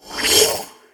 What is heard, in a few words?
open portal; savant